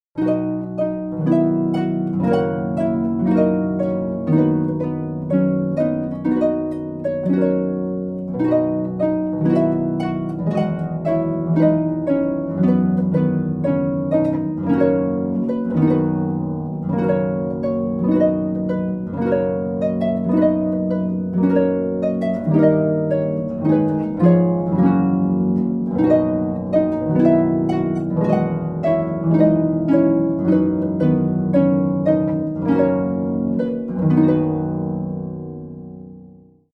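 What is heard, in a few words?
chamber music string quartet; Kari Gardner; Ode to Joy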